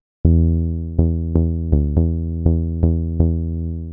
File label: 122 BPM Bass Synth A maj F min Tonic E; Pro Bass Synth Studio Kit; MMB